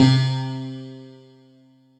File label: Clav, Retro Synths; Lektro Clav; Ableton; P-50 Linear